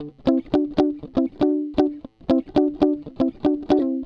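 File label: Latin Jazz Guitar, Dmaj, 118 bpm; Digidesign; Pro Tools Essential Loops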